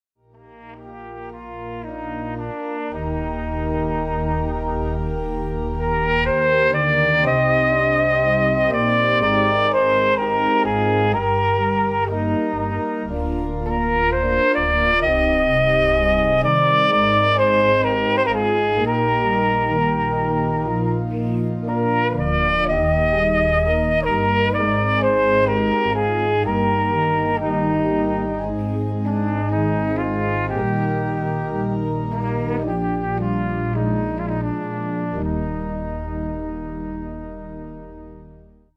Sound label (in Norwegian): trompet